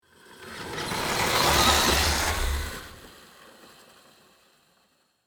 RC truck movement pass by; rc toys

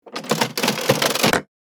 Casino slot machine receipt printer

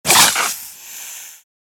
Snake rapid strike attack

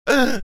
Frightened man gasp of fear

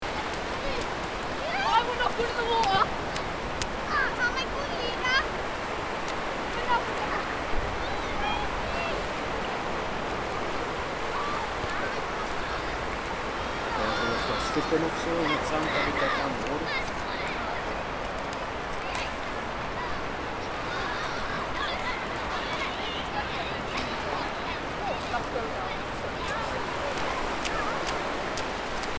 Seaside playground sea waves loop

